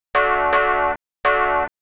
house synth 125 A, keys

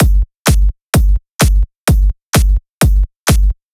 drum loop 128 Strp; Anthemic

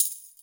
TAMBURIN; drum; PERCUSSION

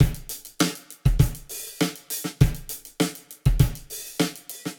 100 Clean Boots, Warped Live Drums; Loops; Touch Loops; CosmicFunk

